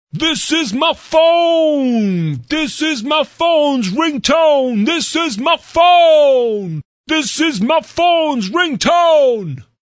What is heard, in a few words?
phones ringtone call notification